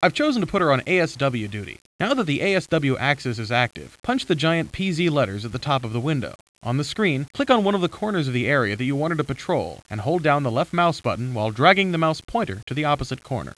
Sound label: HARP